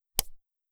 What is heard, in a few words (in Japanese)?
ぱちん, 効果音